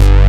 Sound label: FATZ BASS; Pro-Rec - Synthsonic; BASSES